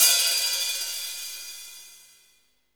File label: HAT, Hi-Hats, HH Modules; Roland L-CD701